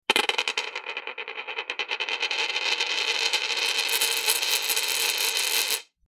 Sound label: coin spin